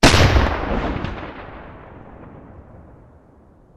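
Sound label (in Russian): пожар, далекий приглушенный шумный удар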